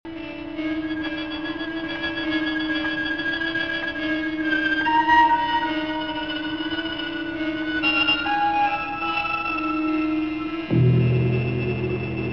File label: violin